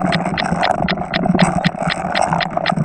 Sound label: cogs